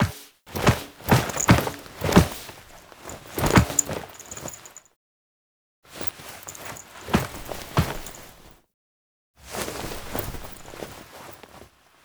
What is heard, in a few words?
Intro Foley Default; Cinematics; Archon Death; Drifter